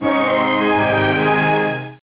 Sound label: Symphonic